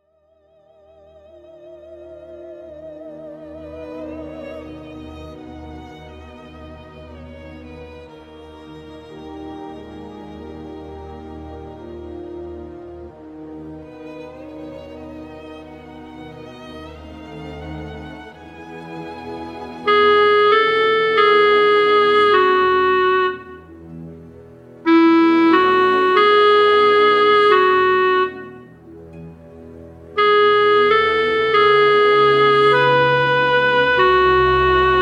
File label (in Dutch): sopraan; Pie Jesu